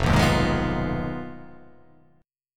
F Minor 7th Flat 5th F down

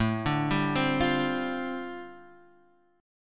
G 7th Suspended 2nd Suspended 4th A chord

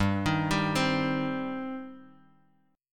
G Suspended 4th Sharp 5th G

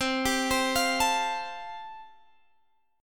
C 6th Suspended 4th C, chord

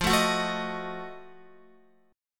F 7th Flat 9th F down Guitar; F7b9; strummed

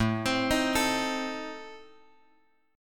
A Diminished, guitar; Adim Chord {5 X X 5 4 5} arpeggiated

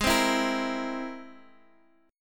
C Augmented 7th Ab

